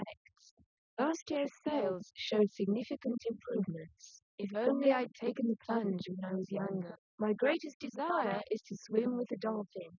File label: female inst; SiSEC10; underdetermined